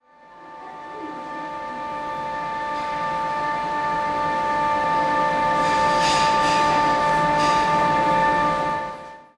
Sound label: noise